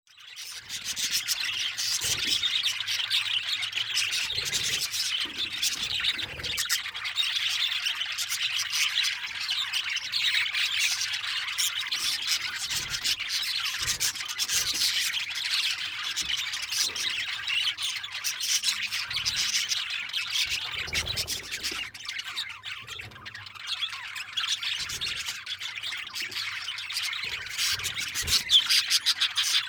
parakeet

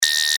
control beep

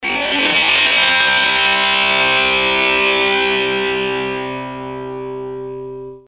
guitar fuzz fx

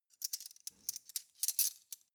cutlery teaspoons handle

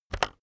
keyboard external enter press very fast

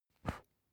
match blow out

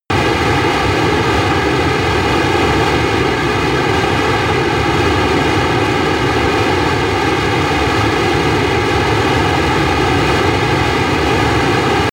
military jet fighter steady noise

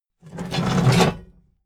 metal scrape big phase

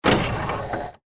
hydraulic door

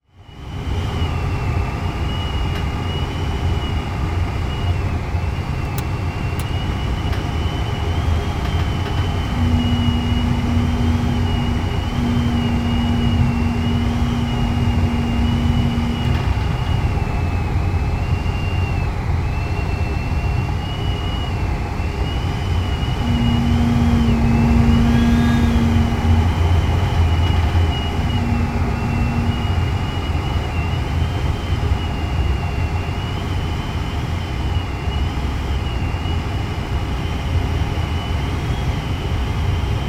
glider cockpit inside noise

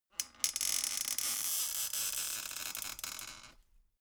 folding chair squeak creak

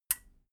metal button radio push power off

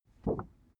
cardboard wobble short medium

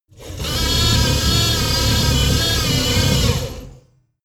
servo large

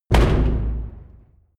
large damper

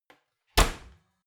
oven close

